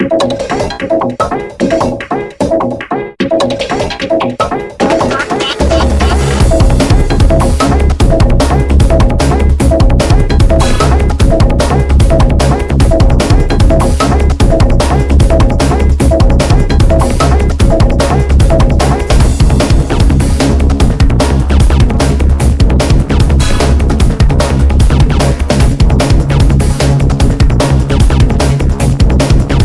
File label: High Lighter; pro evolution soccer 6; ps2